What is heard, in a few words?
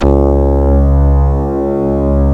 C#, strings; Big Fish Audio